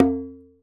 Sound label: TUBANO; E-MU Producer Series Vol. 7 – Old World Instruments